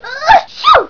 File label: Sneeze